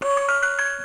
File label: Numbers Notes, toys